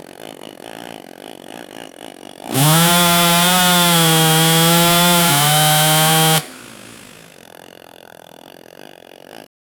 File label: short chainsaw rev; tool